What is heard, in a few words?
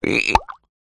Pet Habu Die